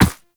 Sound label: Operator Run Ice, Footsteps; Warframe